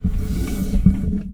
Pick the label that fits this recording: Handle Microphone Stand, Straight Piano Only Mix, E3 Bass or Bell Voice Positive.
Handle Microphone Stand